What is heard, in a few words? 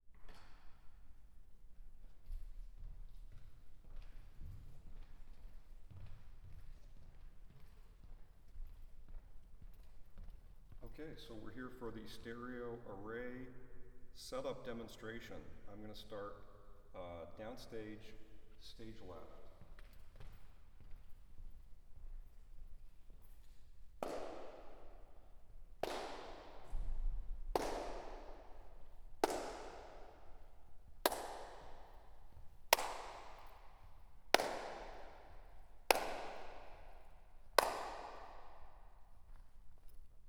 binaural L; chamber96